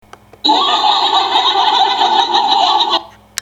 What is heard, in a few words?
sisters laughing